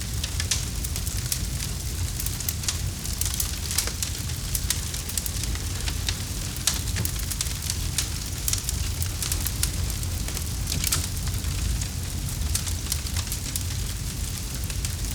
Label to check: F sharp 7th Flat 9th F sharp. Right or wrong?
Wrong